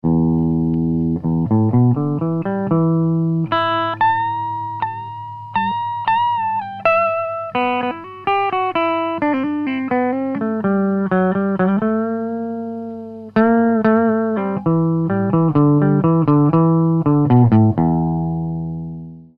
marshall min g slow solo

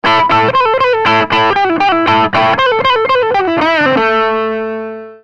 marshall low g fast

